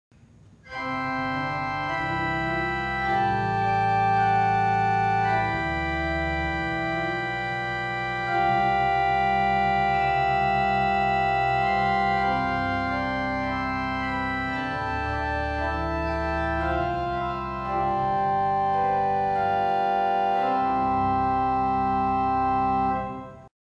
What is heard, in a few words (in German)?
Principalensemble, organ